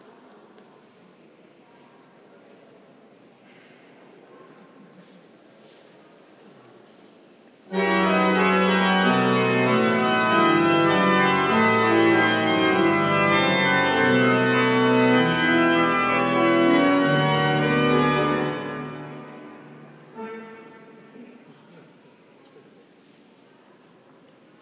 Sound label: organ practice at salisbury cathedral